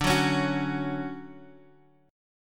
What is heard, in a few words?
D-Major 7th D down Guitar Half Step Down